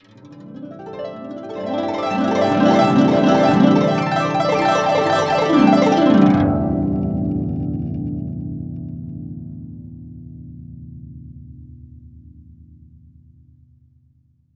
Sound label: GUCH GLS, GUCHIN; INIS - Opium; GUCHIN-GLS